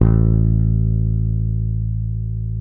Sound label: P BASS SUSTN, A1; Sampleheads; Will Lee Bass Library